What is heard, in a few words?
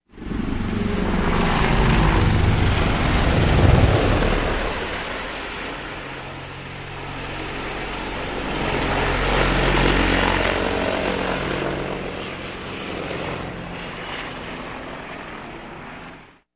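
Apache Climb Reverse Tur, AH-64 Apache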